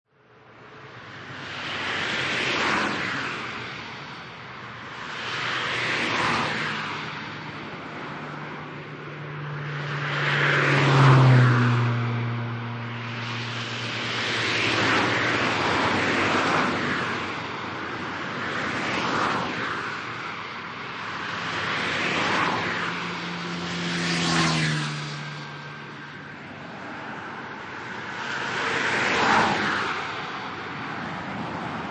Fast Moving Highway Traffic, Cars driving by at high speed; medium traffic density. Occasional moped, scooter driv